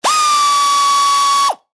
Air Ratchet Dry, Industry, Air, Ratchet, Dry, Burst, Long; Air Pumps; BlastWave